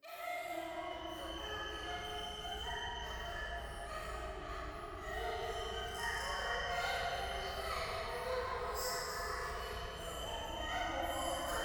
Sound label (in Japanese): 廃墟 喘ぎ 山奥